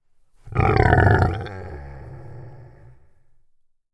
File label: pig